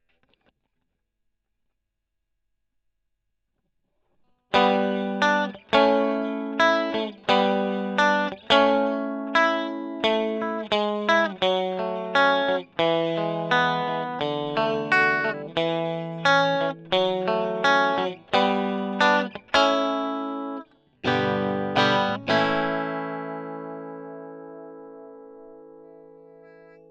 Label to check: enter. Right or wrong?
Wrong